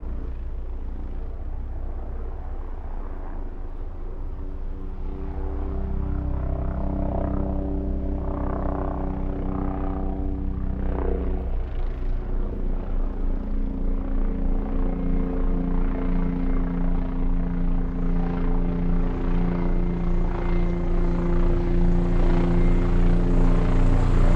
Bell 407 in, overhead turn, away, Utility Helicopters; ec fields